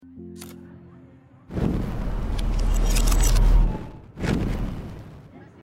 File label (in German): zurueckspulen; Life is Strange; gamesoundopedia